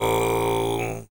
TV SHORT, Vocal World Voices TUVAN LOW; ILIO; Vocal Planet VOL-5

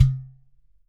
GHATAM; Q Up Arts; Denny Jaeger Private Collection

